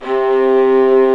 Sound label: String Vlas Sect Agitato; String Master Series; Vlas Sect1-2